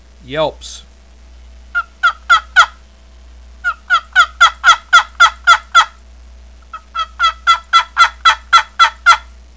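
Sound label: yelps, turkey call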